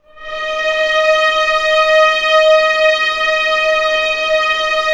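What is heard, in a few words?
strings D#4 PP L; Sound On Sound - Peter Siedleczek's Orchestra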